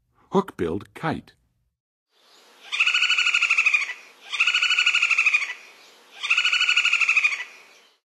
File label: Hook-billed Kite, Birds of North America; Western USA; Western USA Songbirds